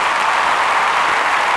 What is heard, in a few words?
Amb Crowd; Cars; XBOX